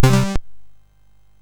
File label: Fire Breath, MOS6581R3